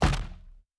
Staff Hit; Fantasy Sounds Bundle; Medieval Combat Sounds

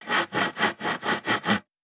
Saw Wood; Fantasy Sounds Bundle; Craft

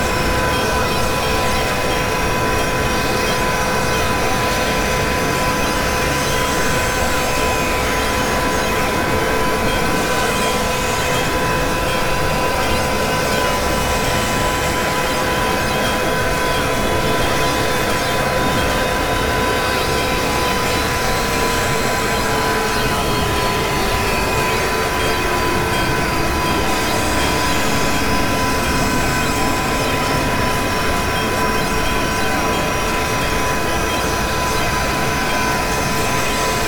fast robot, Ambiences Robots; DeltaVR; FMOD; Imported Ambiences

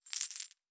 Coins; Fantasy Sounds Bundle

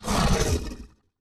Large Creature Short, Monsters; Fantasy Sounds Bundle; Large Creature 13

